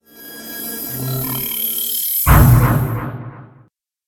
Ui Experience

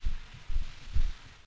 facecrawl; Five Nights at Treasure Island 2020 Remake; windows

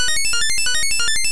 Protect Loop

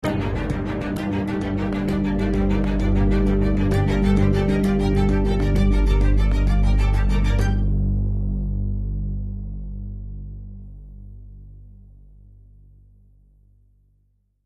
Outro Motor Inn; the walking dead season one

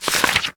Object Book; Inotia 3

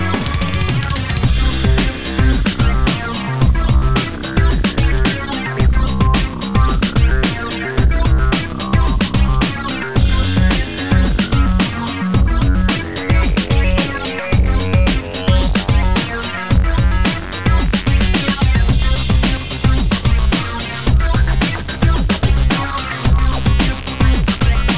Battle

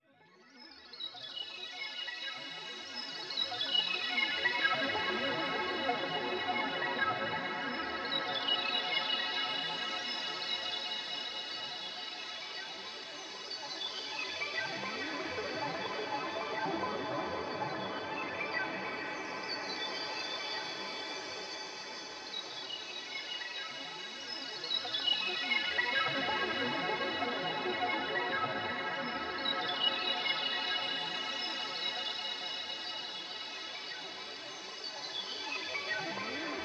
Healed Heart Ambient; 9 Years of Shadows; Windows; Area F

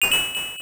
Coin; Hang-On Jr.; System E; arcade

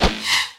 Crossbow Miss

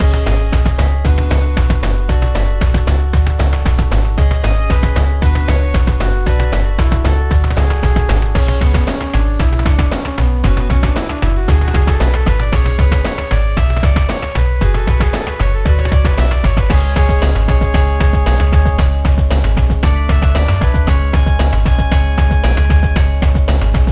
Mountain Cave Boss; ravva and the phantom library

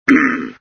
SFX sound (baron swing in); Red Baron

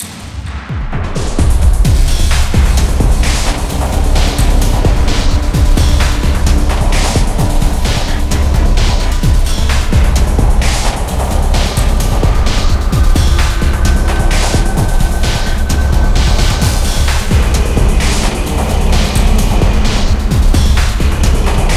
Industrial Complex (Fight); mars war logs

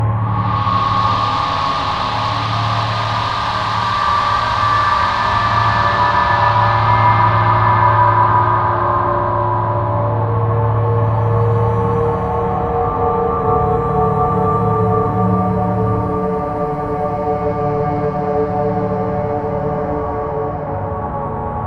Battle Hallway Loop; Zombie Island

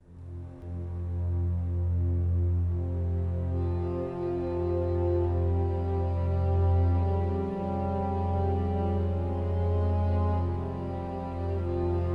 Death; tom clancys ghost recon

